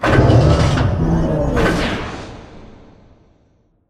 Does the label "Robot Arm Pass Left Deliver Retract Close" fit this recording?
Yes